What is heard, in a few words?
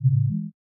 drink; noteye; roguelike